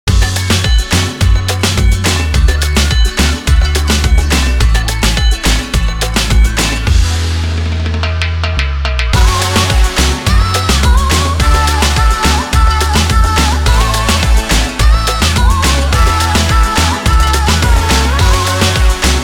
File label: (INSTRUMENTAL)